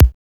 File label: KIK; Drums In Your FACE!